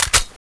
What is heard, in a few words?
m950 clip in